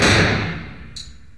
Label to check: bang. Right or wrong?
Right